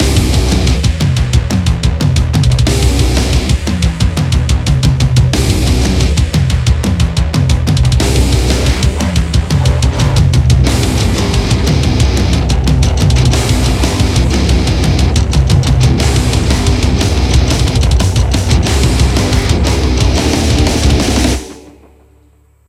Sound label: Music Song Intro; Hellbound